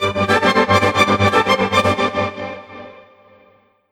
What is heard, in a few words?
ORCHFX, orchestra, Asian Traditions